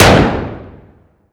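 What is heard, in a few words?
weapon reflection ar urban interior medium side; atmo; gmod; viper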